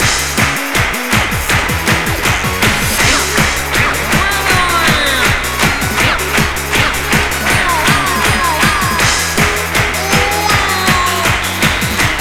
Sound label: Electrodrome Final Lap, Clapping, Frontrunning; Mario Kart 8